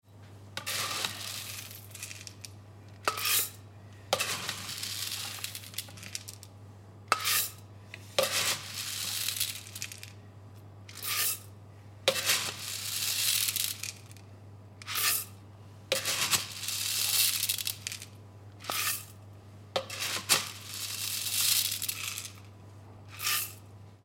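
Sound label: coffee bean sounds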